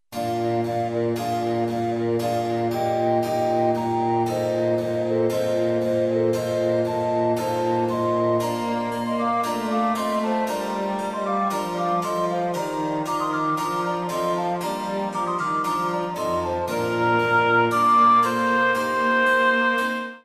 Sheep

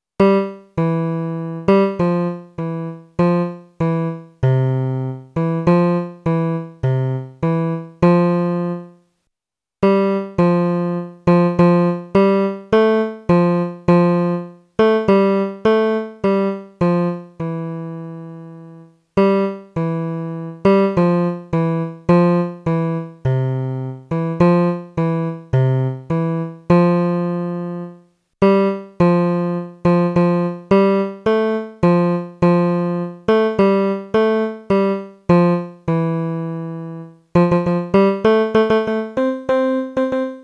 joddelutt bas

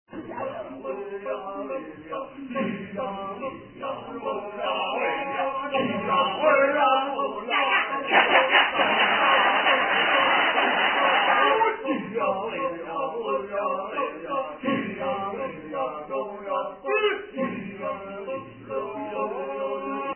kecak

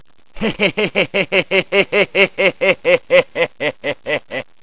hehehe

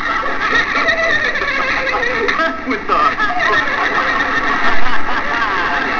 lovely laugh

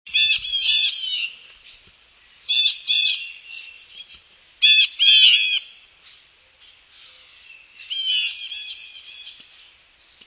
bluejay flight call; birdsongs

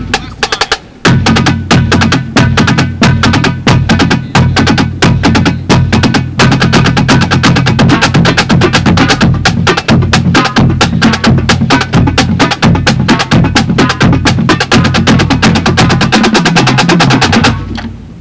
BD Flam Accents

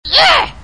ehh

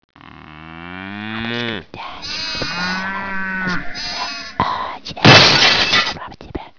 moo smash

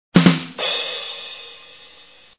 rimshot

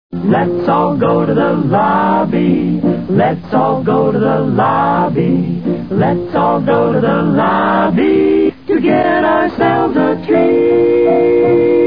lobby jingle

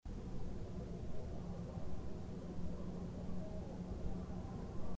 barred owl

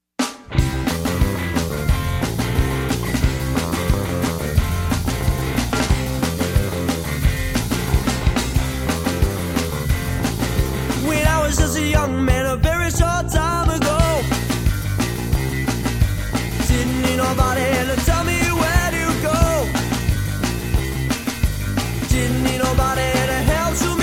blues

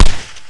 gunshot, funny sound

